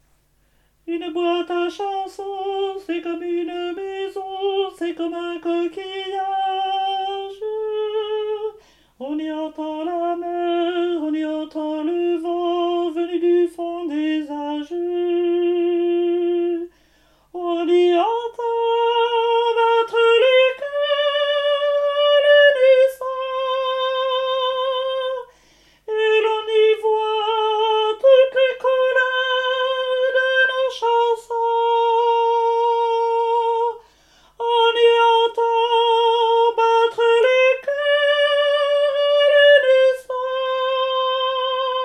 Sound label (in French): soprani